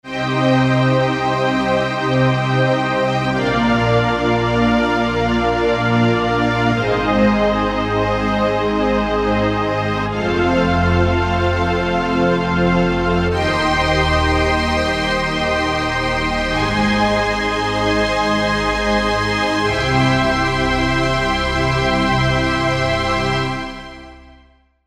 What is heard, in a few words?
Octave Strings